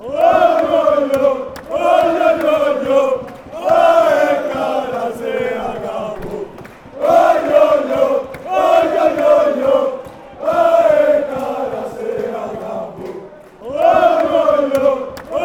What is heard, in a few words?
chant; aek athens